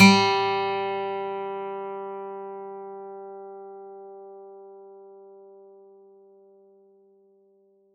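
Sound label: Plucked steel string G3; Acoustic and Electric; Polyend; Simulacrum Sounds; 1 Shots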